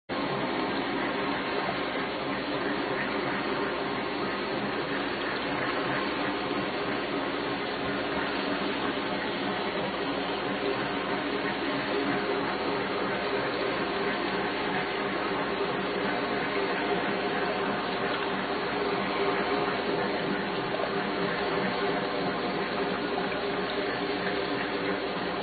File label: repeated S19s, orcasound